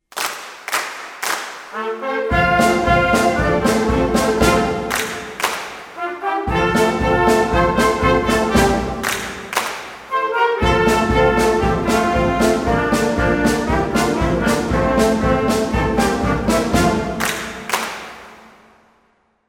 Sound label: bass